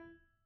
Piano No Sus Rel Close E4, Grand Piano, Steinway B; Chordophones